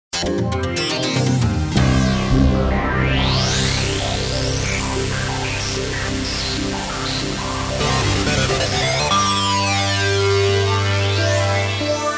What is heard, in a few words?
stingers